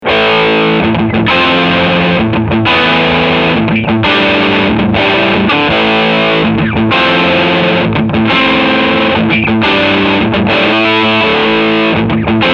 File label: Leadsound 2